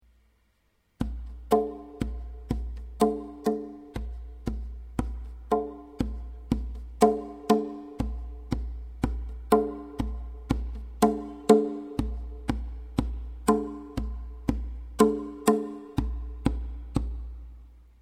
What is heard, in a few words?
tonbak